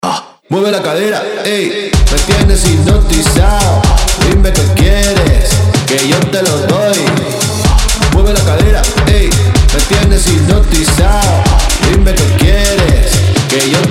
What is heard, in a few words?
Latin Vocals; Toolroom